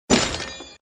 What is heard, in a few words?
Sfx Enemy Knight Dead; Mighty Knight 2